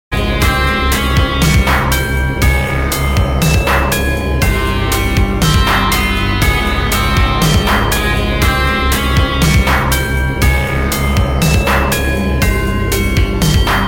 halloween trap remix

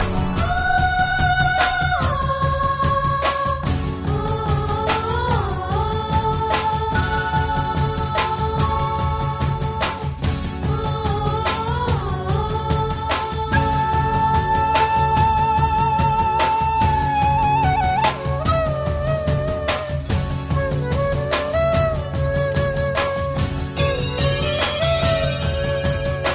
flute; Veera; Indian

